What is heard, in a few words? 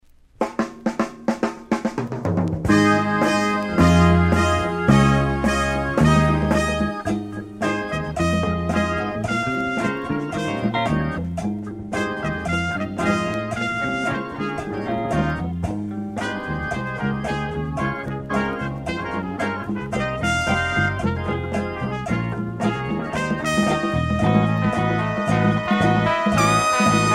Instrumental; Coca-Cola; People Power